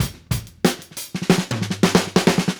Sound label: Call And Response Drums B Fill, 70s Analog Funk; Sonic Foundry (Sony Creative Software); What it Is!